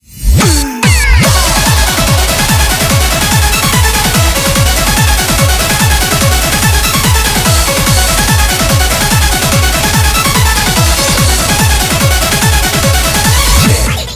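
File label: background music crossfade; Invaxion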